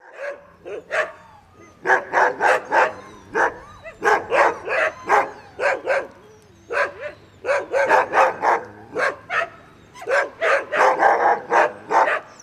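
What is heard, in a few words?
Dogs Barking To Crying, husky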